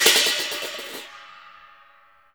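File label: CHINA, cymbal; Spectrasonics; Heart of Asia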